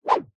cartoon swipe fast swish; zapsplat

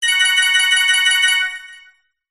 multimedia game star win gain x8; zapsplat

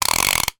toy train pull back to wind up motor

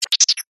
science fiction robotic insect critter chirp

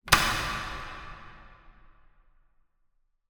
industrial door lock reverberant

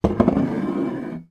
foley bottle wine slide across wood table

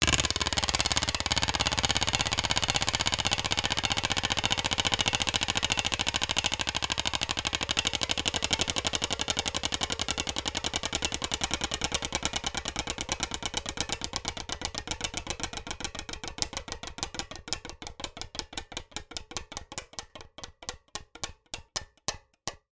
wheel of fortune style wheel spin; zapsplat

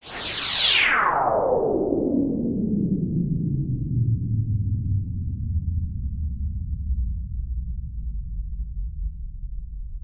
sound design whoosh slowing down slow motion

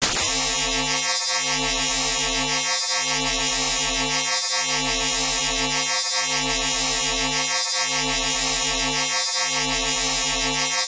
science fiction laser hypnotic paralysing beam; sound effects one

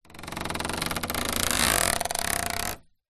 office plastic squeak stretch adhesive tape

